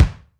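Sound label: POWER KITS, kick; 90 sSampleCDs; Crypton - DrumBase5000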